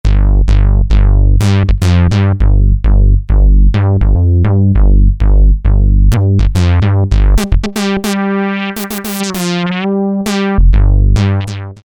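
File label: Roland JP-8000, Spit'n Slide Bass; Factory Patches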